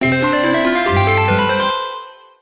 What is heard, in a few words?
collect; Beauty Fairy